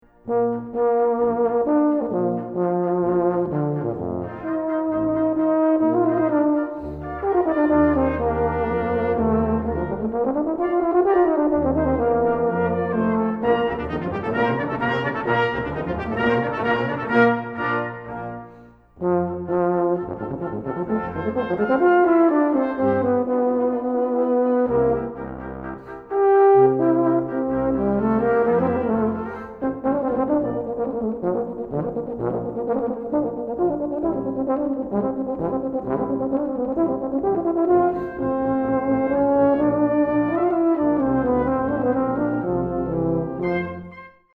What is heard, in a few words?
Basson Concerto Allegro